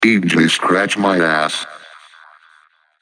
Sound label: dj scratch